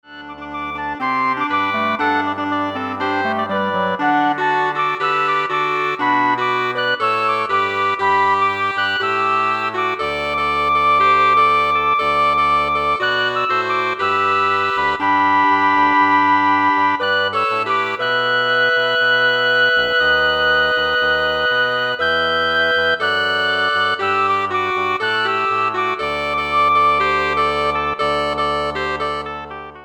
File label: Woodwind Ensemble